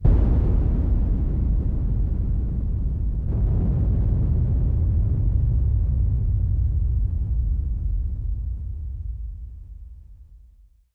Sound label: thud, tomb; quake2; zaero